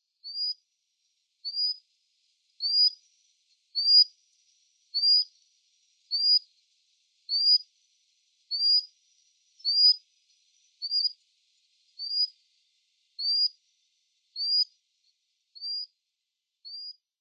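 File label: northern goshawk, tatsuno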